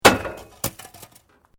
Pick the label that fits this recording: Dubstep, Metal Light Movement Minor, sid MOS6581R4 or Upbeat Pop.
Metal Light Movement Minor